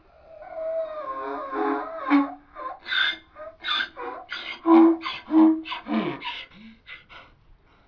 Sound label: donkey; kids; Linda’s Learning Farm and Play Park; Semmes, AL